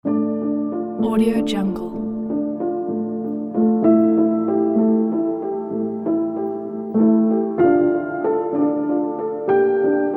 sad emotional piano

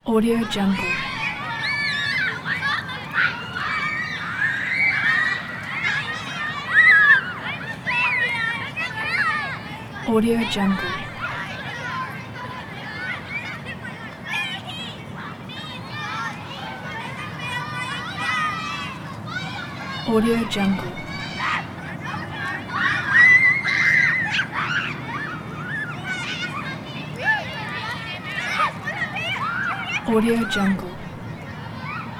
kids playing in park ambience; soundjay

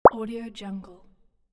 pop

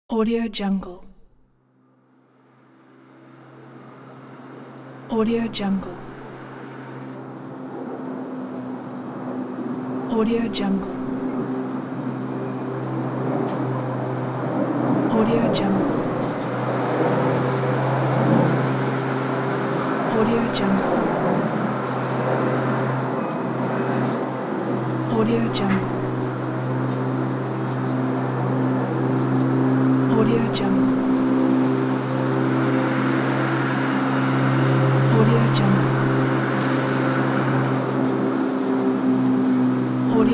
uk garden lawn mowing

